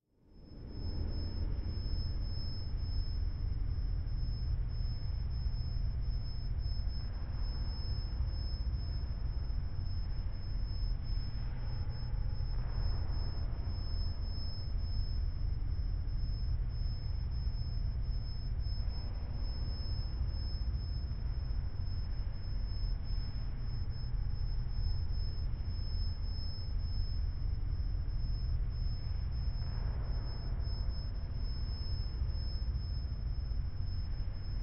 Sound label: Atmosphere Eerie Low Room Tone With High Pitched Tone; Hollywood Edge; sound designer's toolkit v2